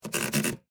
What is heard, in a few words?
Typewriter Scroll, Technology, Typewriter, Smith, Corona, Scroll, Paper; Typing Sound Effects; BlastWave